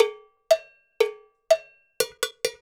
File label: Cow Bell, Latin Percussion